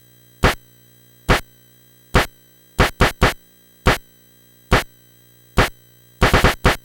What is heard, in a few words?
NES Clap Loop; Nintendo Entertainment System; Drums; Chiptune; Sonic Foundry (Sony Creative Software)